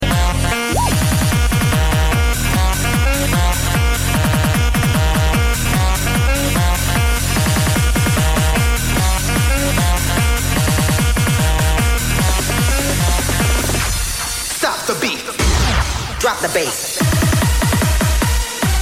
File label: Horns Beats